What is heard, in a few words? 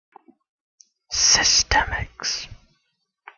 whisper; Systemix; Signatures